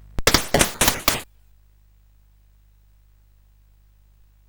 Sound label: sid CSG8580R5; California Games